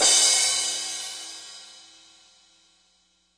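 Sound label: classic hip hop crash; STYLE KITS (Hip Hop, Etc); Classic Hip Hop Mega Kit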